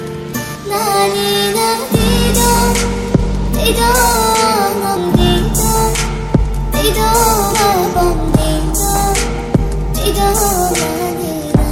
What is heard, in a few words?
remix